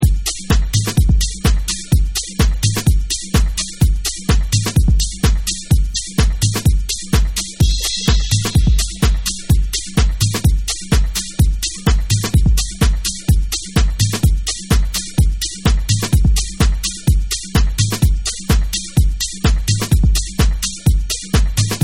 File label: Beats